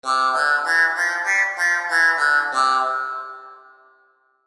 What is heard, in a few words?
Chime Musical, Chime Musical Multimedia; Web Interactive; Chimes; Technology; Blastwave